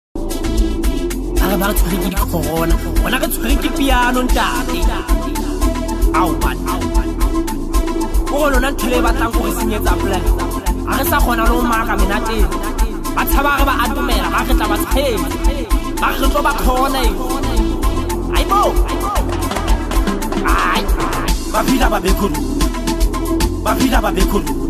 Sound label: Amapiano